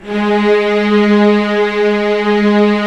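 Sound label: string section; Roland - LA Composer vol. 1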